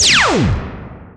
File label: rapid laser; weapon